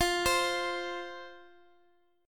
F 5th F Guitar Open D

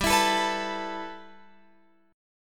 G 6th Add 9th, Guitar, Open F; G6add9 strummed

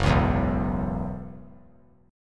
F sharp 7th Suspended 4th; F#7sus4 strummed

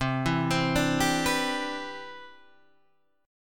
C Major 9th, C, down, chord; arpeggiated; CM9

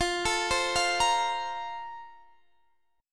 A sharp Major 7th Suspended 2nd

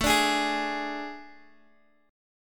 A Major 7th Suspended 4th, Guitar, Open D

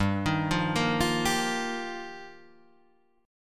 G Minor 7th Sharp 5th

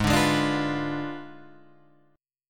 Ab Diminished 7th Ab Guitar Standard; Abdim7 strummed; JGuitar

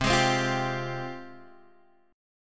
Ab Major 13th C

